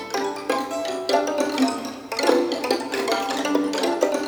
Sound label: STR FX, HORROR; East West - Global Sounds